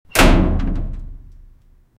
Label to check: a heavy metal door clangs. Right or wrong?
Right